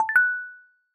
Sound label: notification sound